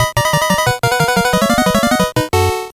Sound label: Stage Start; Normal Game (Retro); bomberman party edition